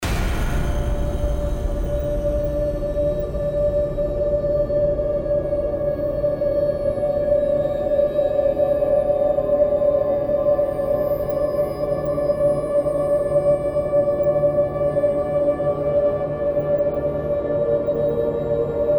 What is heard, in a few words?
Stingers - Tension; Planet Alpha